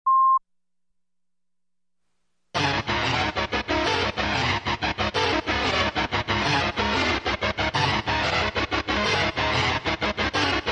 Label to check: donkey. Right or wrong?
Wrong